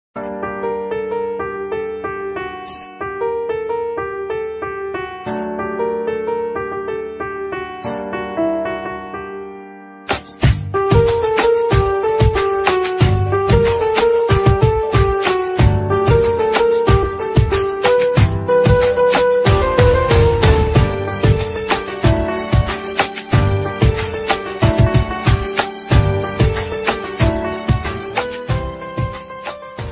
instrumental ringtones telugu bgm ringtone love bgm ringtone